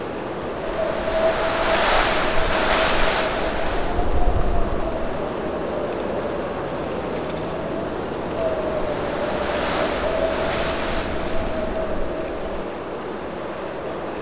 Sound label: windy